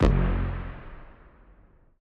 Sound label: bomb